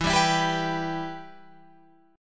E-Minor, Guitar, Open F; strummed; JGuitar

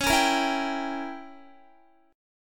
Db Diminished 7th, Guitar; strummed

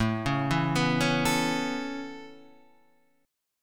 A Major Add 9th A Guitar Standard, chord; Aadd9 arpeggiated; JGuitar

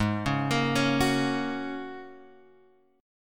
G sharp 9th Suspended 4th G sharp; G#9sus4 Chord {4 4 X 3 2 2} arpeggiated

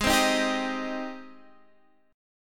Ab Major 7th Ab down Guitar Drop D; AbM7 strummed

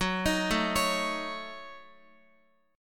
F sharp Minor 6th, Guitar; F#m6 arpeggiated; x,x,6,8,9,9

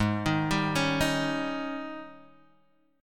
Ab Major 7th Suspended 4th Ab down; arpeggiated; JGuitar

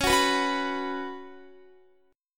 Db 7th Suspended 4th Db down Guitar; strummed

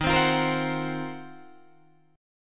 D sharp Minor 6th Add 9th, down Guitar DADGAD

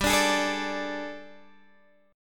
G Suspended 2nd Add Flat 9th G, chord; Gsus2addb9; sus2addb9:1,2,(5),b9; x,10,12,13,10,10

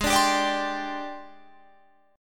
G sharp Major 7th Flat 5th Guitar Drop D

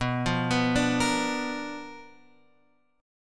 B Minor Major 7th Flat 5th Guitar Open F; arpeggiated; JGuitar

